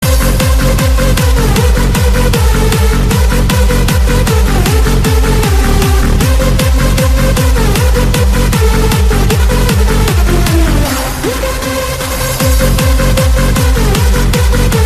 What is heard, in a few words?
ringtone